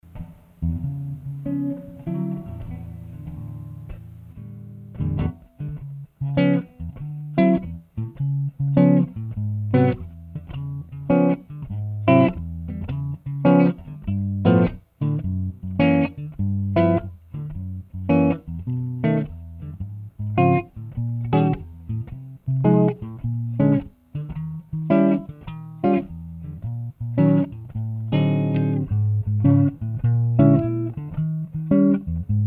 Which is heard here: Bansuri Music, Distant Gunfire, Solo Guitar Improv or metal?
Solo Guitar Improv